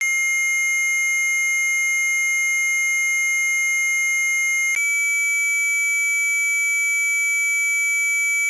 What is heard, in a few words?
rtty tones